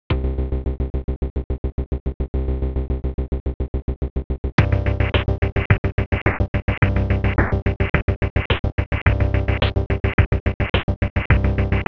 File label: intro music; majic 12; zodiac